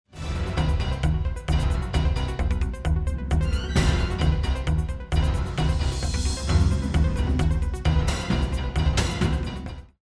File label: round ten sec count; music kits custom